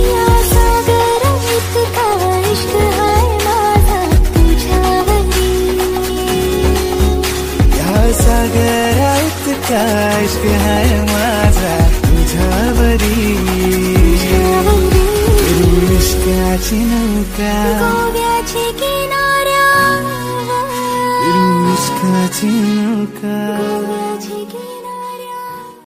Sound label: best bollywood music ringtone